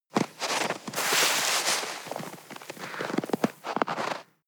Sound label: snow grab